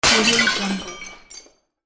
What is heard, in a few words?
plates smash